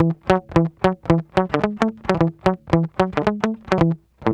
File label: Guitar Loop; Ambient Grooves